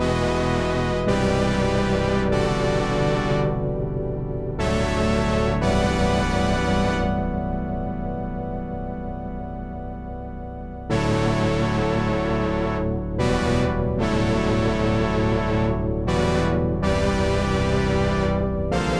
block chords

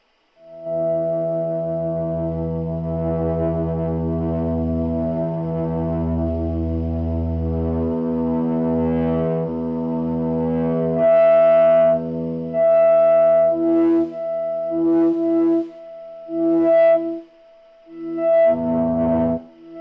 E drone gtr

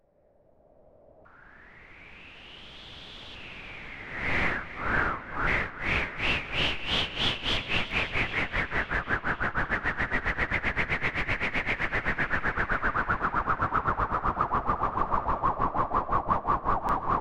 wind noise

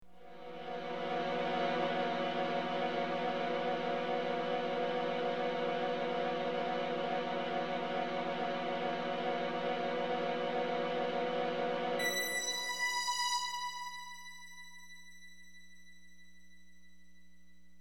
beautyday drone

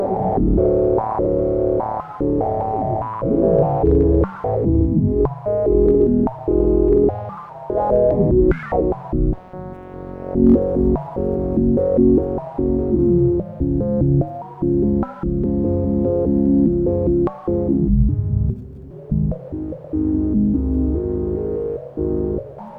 rando pulse

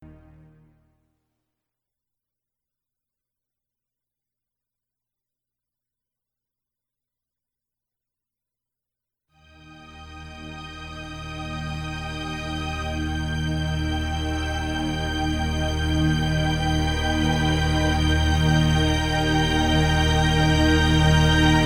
Eb drone strings

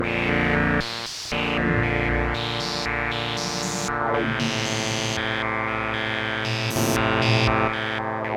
Bb pulse 114

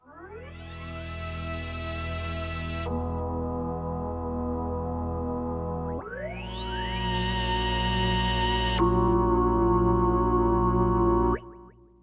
flat5 drone; chromaris

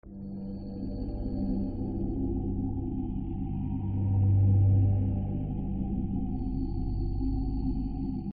ambient cave

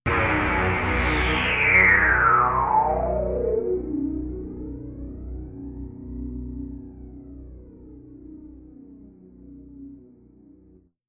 sweep drone; chromaris

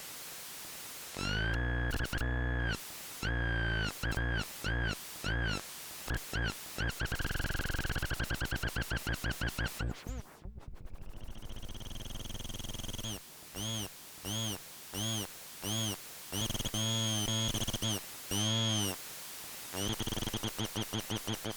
dissonant noise

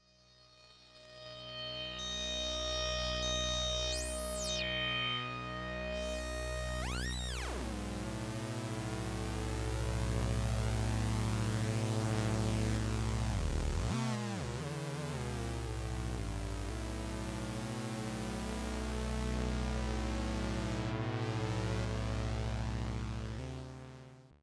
E drone

